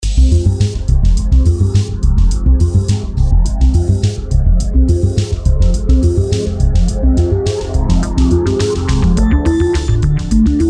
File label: Dark Motion Loop; SafeMusicList